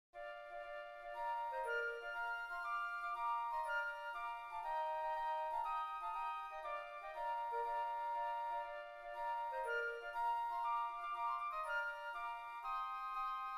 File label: Battle Hymn Flutes